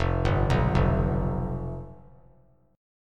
F Suspended 2nd Flat 5th, Fsus2b5 chord; arpeggiated; JGuitar

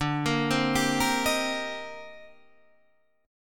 C Minor 7th D; Cm7/D arpeggiated; JGuitar

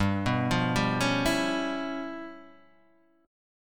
C sharp 7th Sharp 9th Flat 5th, chord

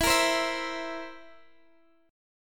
A sharp Suspended 2nd Flat 5th E

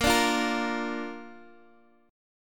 A sharp Major

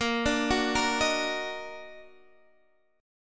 Eb Minor Major 7th Bb, chord

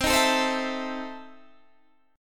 E Major 7th Suspended 4th Sharp 5th, C

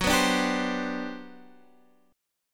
G Major 11th Gb

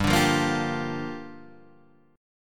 G Minor 7th, Guitar, Half Step Down

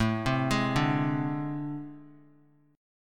A Minor Major 7th Double Flat 5th, down, Guitar; arpeggiated; JGuitar

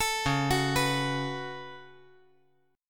C sharp 7th Suspended 4th Sharp 5th

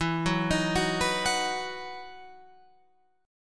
E Major 7th Suspended 2nd, Guitar, Open F; EM7sus2 arpeggiated

